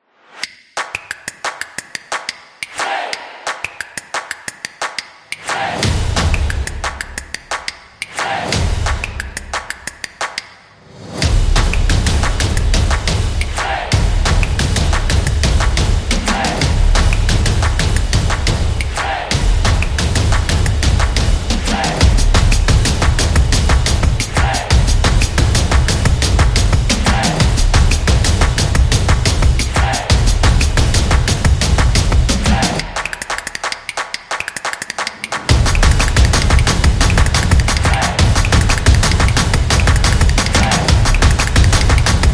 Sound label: The Drums Impact